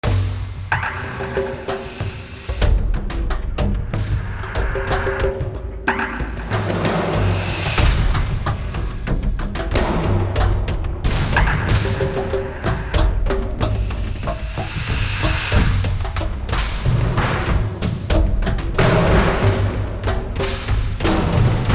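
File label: Battle Loop Rural Percussion; Fallout New Vegas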